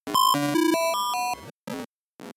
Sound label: broken computer collapse